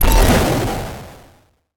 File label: 8bit retro sfx contemporary explosion; sonniss gdc